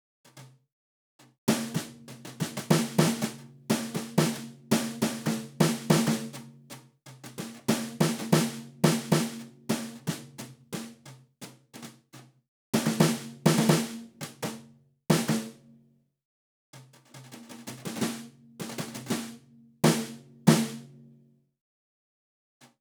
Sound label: brush 14 inch rock snare; Brushes on 14 Inch Rock Snare; professionally recorded acoustic drums